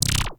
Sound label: Corrupted Notes